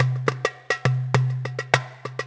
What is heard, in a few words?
arabic music; Ambient; MX vs ATV Unleashed